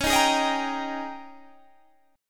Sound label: G sharp Major 7th Suspended 2nd Suspended 4th